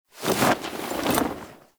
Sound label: Camp Canopy Flap; Quests; Veilbreaker; Kahl Camp